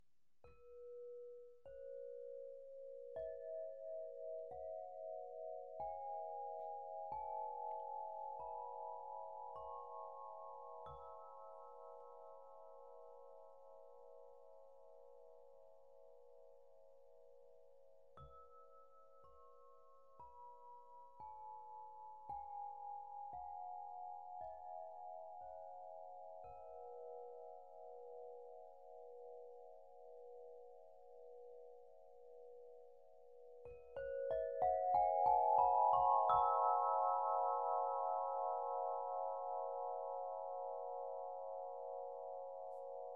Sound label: Earth Om Cis 432hz, Crystal Harp; Harfa krysztalowa Ziemia OM C B